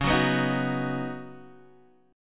Db 7th Suspended 2nd Suspended 4th, Guitar, Open D